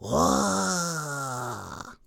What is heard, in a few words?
AMB China 3D Amb; gamerip